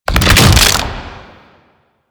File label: Octopede Head Spin; Warframe; Man In The Wall